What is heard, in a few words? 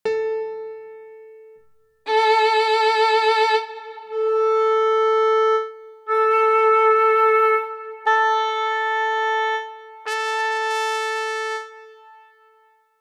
A440 instruments A4